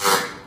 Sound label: fart hair cutter shaver